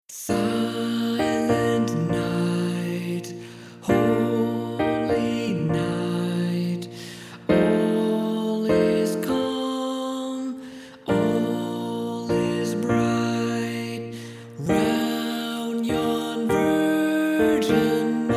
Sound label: Silent Night Tenor; christmas choir rehearsal tracks